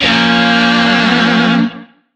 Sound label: Shawzin Hirajoshi Chord C; Music; DE; Warframe; Lizzie Shawzin